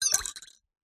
household teaspoon set down in mug of hot tea or coffee